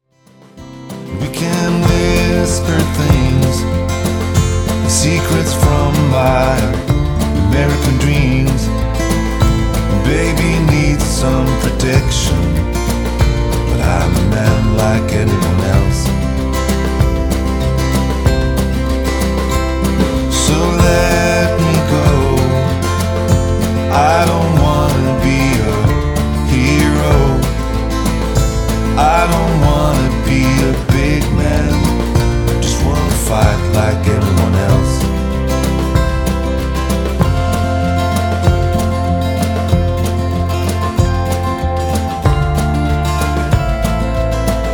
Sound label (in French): guitar voix